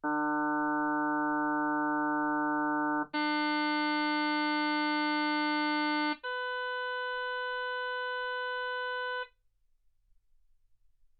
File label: harm sinus 48kHz